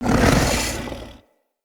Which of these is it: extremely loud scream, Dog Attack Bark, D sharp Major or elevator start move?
Dog Attack Bark